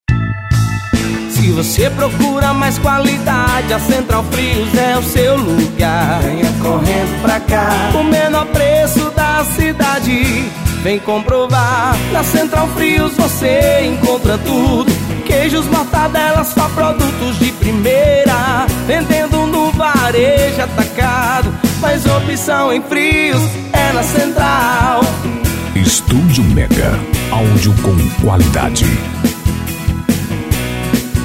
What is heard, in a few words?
JINGLE; CENTRAL FRIOS